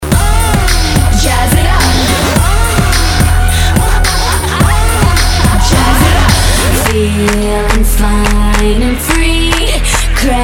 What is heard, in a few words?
Birthday, ringtone